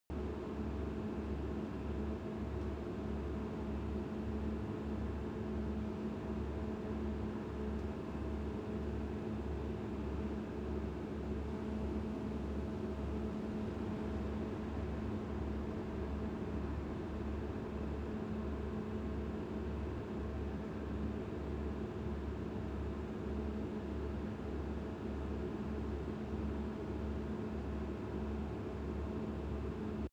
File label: construction site calm